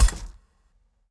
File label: arrow; RYL